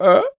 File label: Shocked; shocked by a Goron; Groose; Skyward Sword